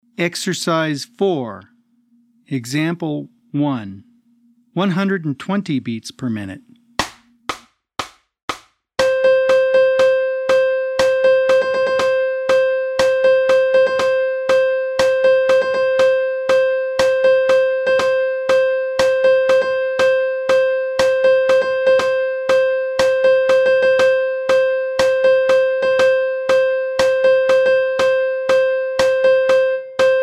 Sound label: 120 BPM